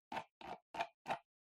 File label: PC Mouse Far Scroll Fast